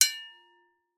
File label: impact metal light resonate